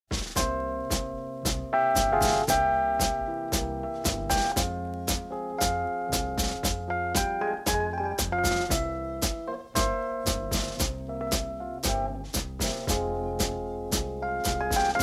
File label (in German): Tanzrythmen Tango